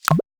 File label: Grab